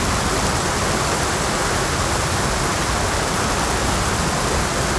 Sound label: ran loop; DAoC